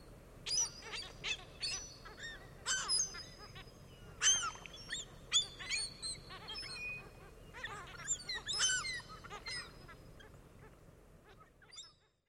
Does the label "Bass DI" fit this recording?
No